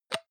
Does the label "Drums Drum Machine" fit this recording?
No